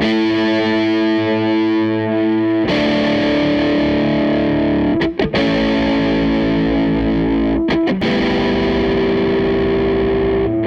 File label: Les Guitar Loop; Sonic Foundry (Sony Creative Software); Six-String Orchestra